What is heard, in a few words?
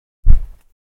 short wind dash sound